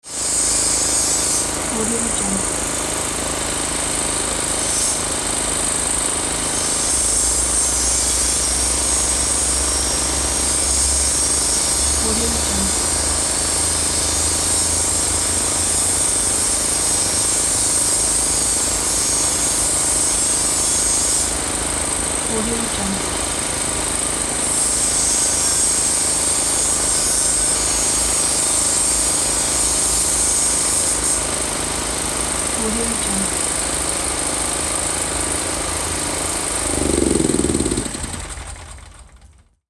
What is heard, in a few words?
road work